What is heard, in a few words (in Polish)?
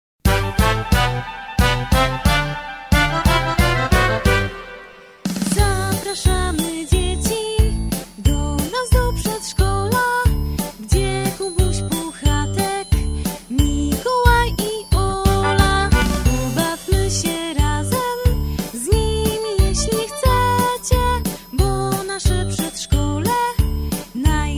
Hymn Przedszkola